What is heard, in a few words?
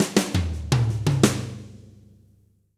086, Fills; Zero-G; Monster Beats